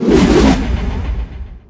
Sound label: wild axes; weapons; dota; beastmaster